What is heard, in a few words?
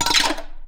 DISHES, household; AKAI Anime Sound FX